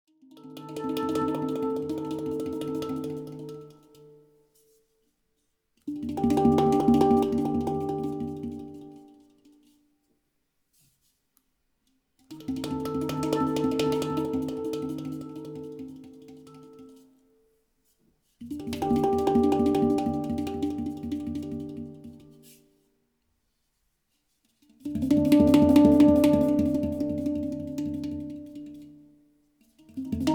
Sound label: Pure Handpan